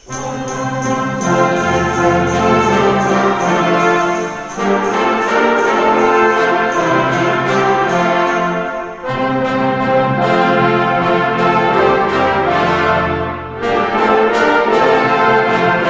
Brass Rehearsal

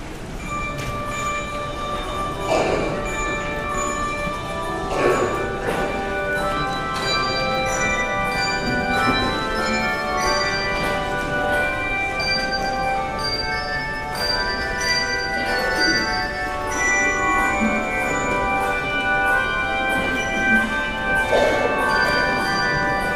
Handbells; Rejoice Rejoice Believers

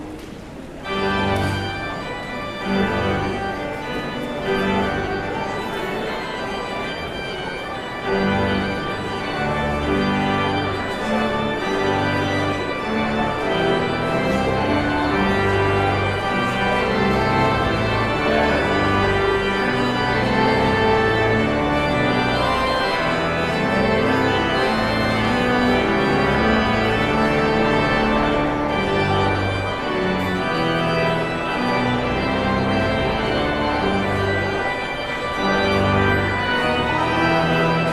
Postlude

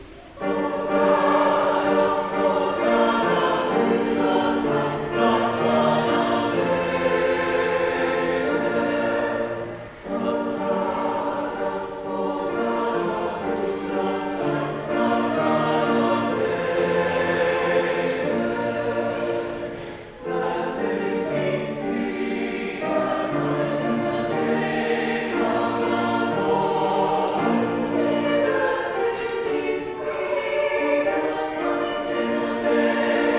Choral

